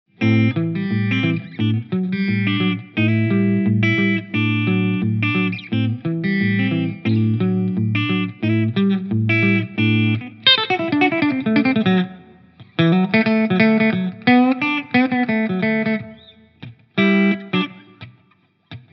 Barncaster beide Tonabnehmer Steg getapped clean; Baboushka Tarot

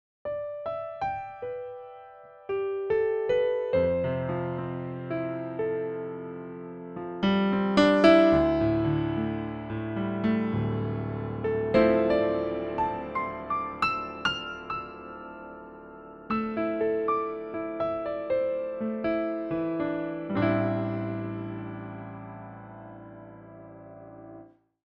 FX Piano direkt; K1800; Logic

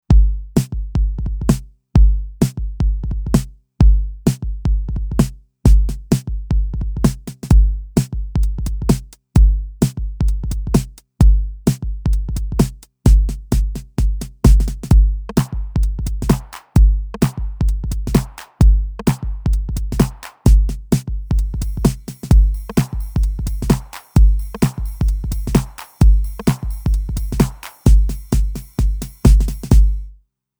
TR-909 pur

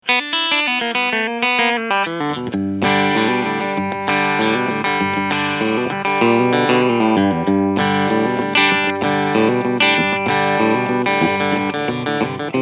Cleansound Steg PU; Harley Benton TE-62CC LPB

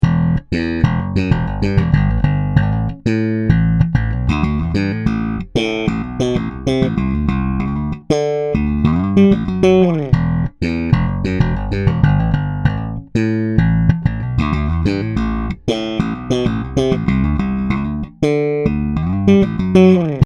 Music Man Caprice Slap Hinterer Pickup

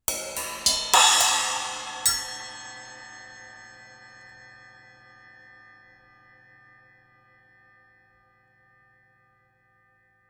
Becken; LCT-340

